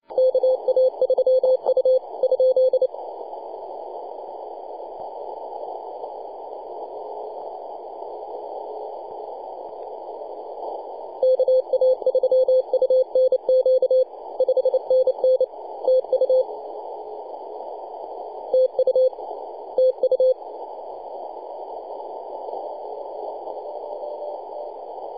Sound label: cw; yb4ir